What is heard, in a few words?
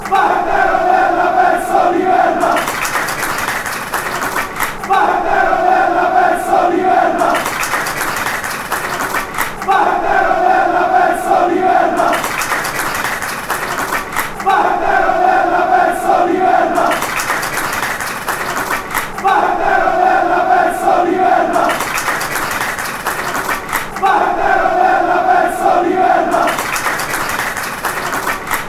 piece fanchants